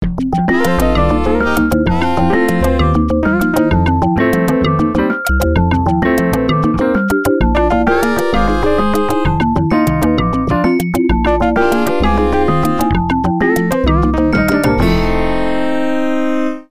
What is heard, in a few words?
6 8 world blues